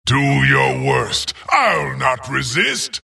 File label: death long; dota; skeleton king